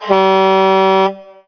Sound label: truck horn